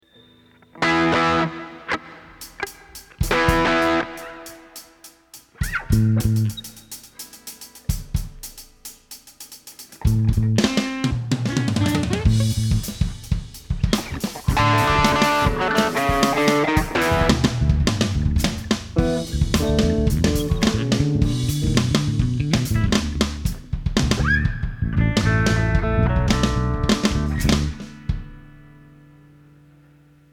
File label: Expensive Drum Samples Mix